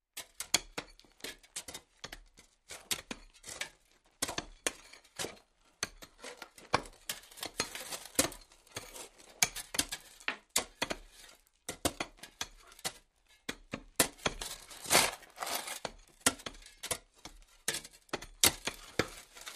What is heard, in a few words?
Shovel Dirt Multipl